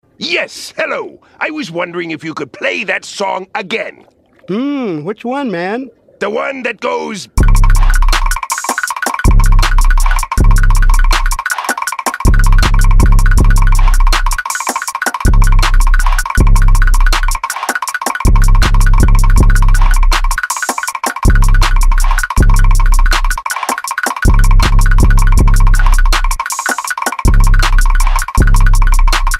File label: walking type beat; mr. krabs